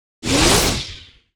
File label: sparks wild Expansion Wind Up; netent